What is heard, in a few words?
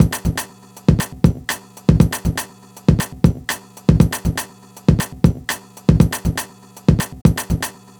Old School Break, Drums